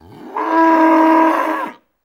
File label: Cow Excited Bellow